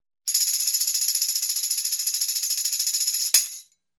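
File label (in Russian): средне трясут и удар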